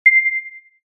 ピン

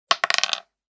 サイコロを振る 一個

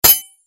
短剣 金属音 モノラル

